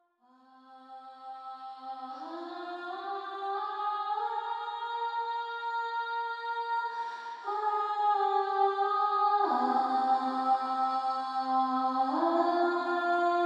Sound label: Distant Singing; fire emblem three houses gamerip